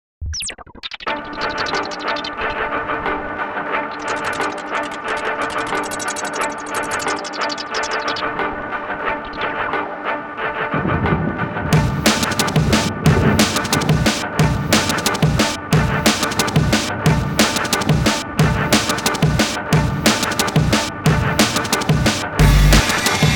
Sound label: thunder